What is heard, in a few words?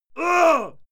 male fight yell; menschen; schreie